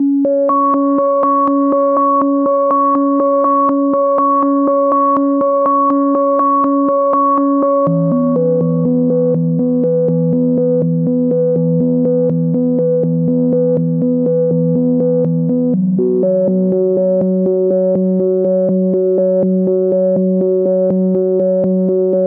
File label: 122 BPM Melodic Arps A maj F min Tonic D; MMB